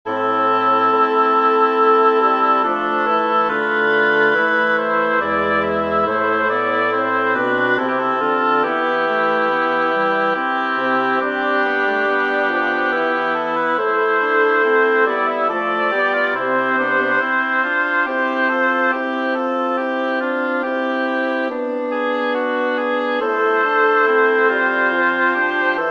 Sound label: choral prelude, double reed quartet; Buxtehude, Dieterich; Puer natus Bethlehem